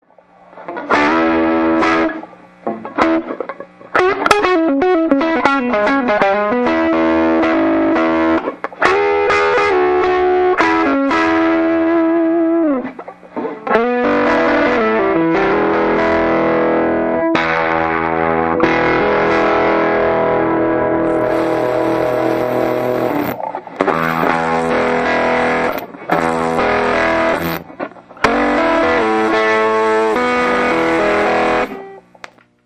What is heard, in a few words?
champ problem buzz short, tweed champ clone problem